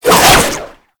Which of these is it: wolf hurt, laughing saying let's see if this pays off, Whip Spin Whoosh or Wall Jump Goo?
Whip Spin Whoosh